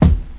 rich mids kickdrum sample d key, kickdrum sample tuned to the D note